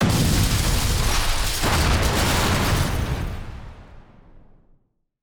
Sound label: big explosion; CrossCode; battle